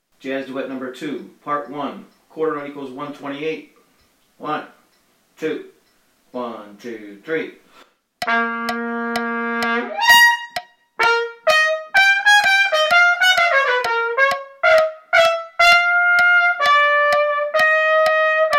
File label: jazz trumpet duet; TTN Jazz Duets; Jazz Trumpet Duets! (#2)